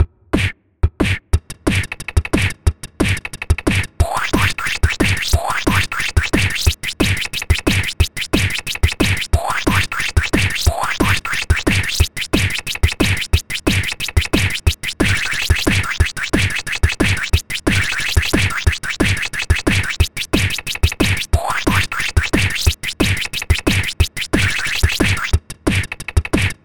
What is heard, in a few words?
dnb Cool raw